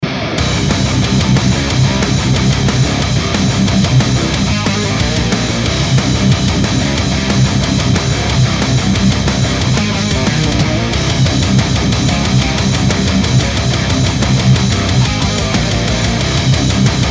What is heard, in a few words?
KEMPER BACKING